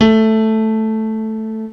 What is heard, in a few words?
piano; MATLAB